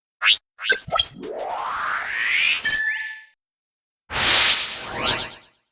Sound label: start